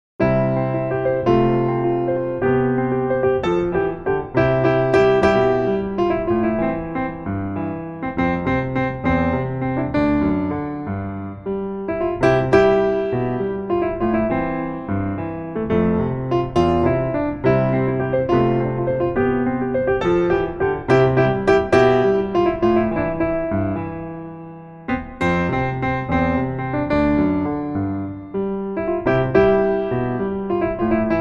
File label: Piano